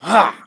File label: jump